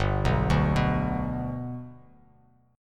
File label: G Major G down Bass Standard; G arpeggiated; JGuitar